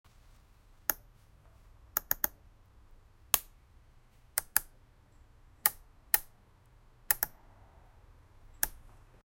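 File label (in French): un son très court pour, menu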